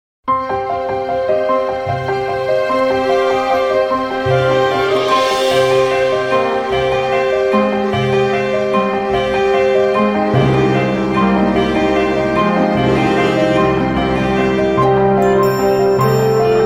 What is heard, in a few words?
Ring Bells